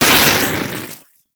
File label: Smithy Forge Fire Coal Burst; Soul Plane